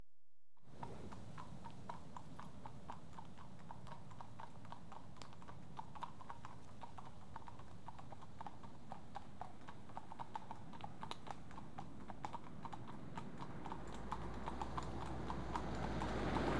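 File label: Two horses approach at walk on tarmac; BBC Sound Effects Library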